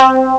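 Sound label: electric guitar 1 note; Amiga Soundtracker Sample Packs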